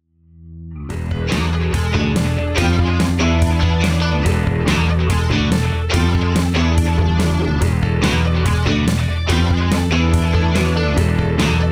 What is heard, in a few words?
bass groove 143bpm